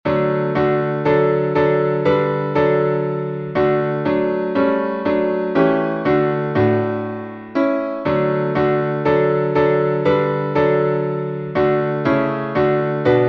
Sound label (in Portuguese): salmo playback